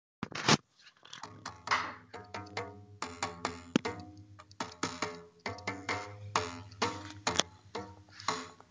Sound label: violmetal